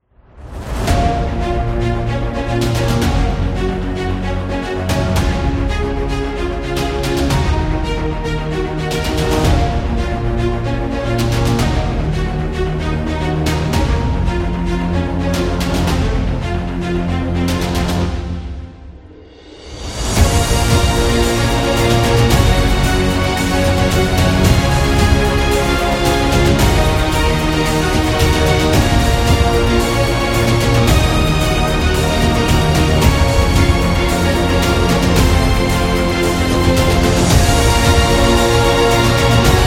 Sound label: An Epic